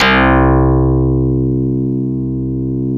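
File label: C3, synth, Progress DX Moog, CASIO FZ-1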